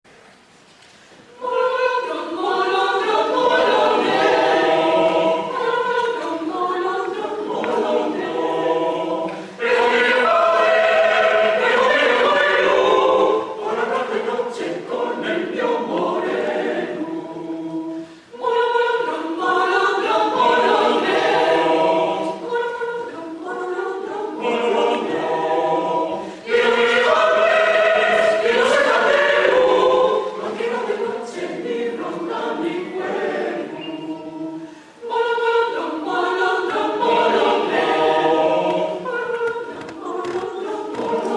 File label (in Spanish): coro mixto; 9a Bienal Polifonia Regional Autoctona; pena santa